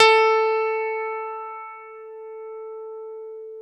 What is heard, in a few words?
PNO, Electrics, Grands; CP70; Club-50 - Foundations Roland